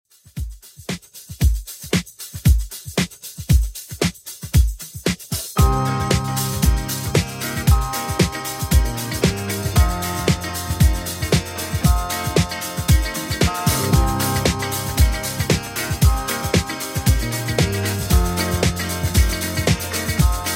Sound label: 70s 115bpm; Redrum